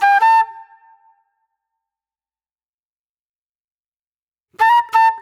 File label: J-Funk Flute, Call And Response; Sonic Foundry (Sony Creative Software); Manhattan Flutes